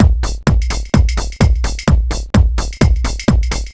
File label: 128BPM Full No Claps, techno; drum fx; Beats